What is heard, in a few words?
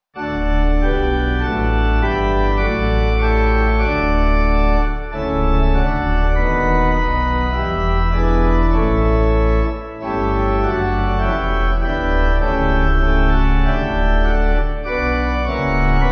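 Pipe, hymn backing; O Give Thanks; Mt Zion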